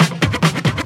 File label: Loops Fills; Vengeance Samples